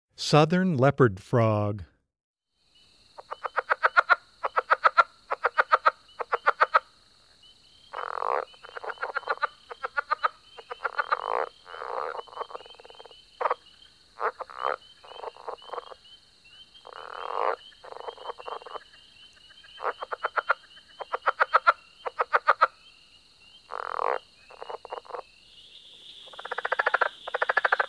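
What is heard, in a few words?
Southern Leopard Frog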